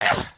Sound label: knockdown; creatures organic